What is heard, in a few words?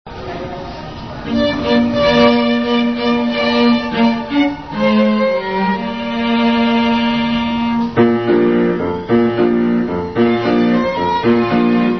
jingle